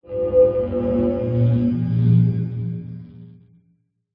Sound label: science fiction mysterious swell